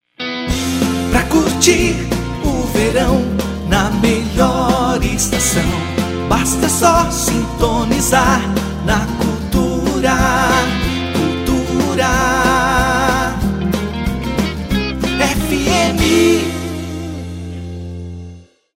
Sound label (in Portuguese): vinheta Cultura FM